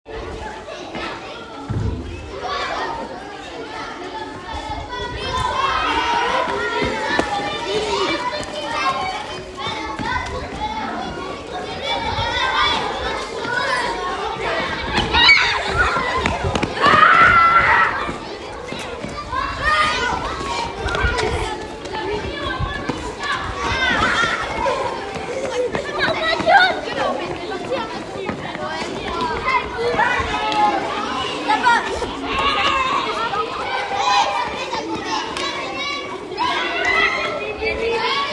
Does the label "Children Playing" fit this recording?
Yes